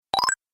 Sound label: Pickup Points, video game sound effects